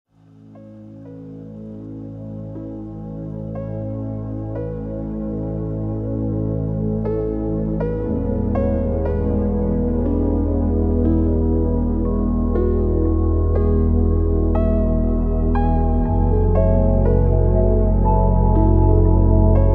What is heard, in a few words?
Nordic Drone